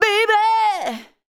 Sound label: vox, Techno Trance; Ueberschall